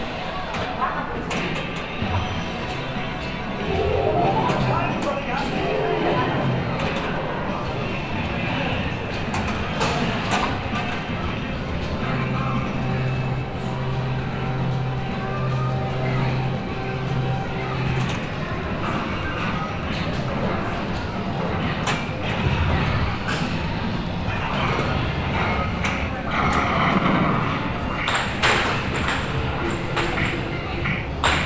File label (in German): Am Flipper, Fieldrecordings, Brasilien